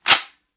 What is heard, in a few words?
emptymag, weapon; midnight guns